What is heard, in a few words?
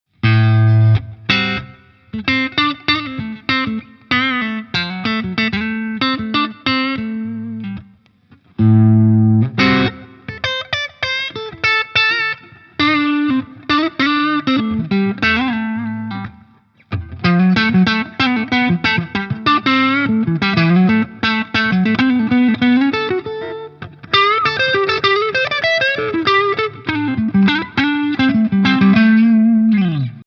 PRS Gain; Horsemeat